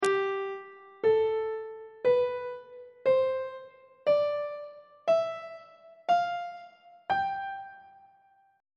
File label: mixolydian mode on treble clef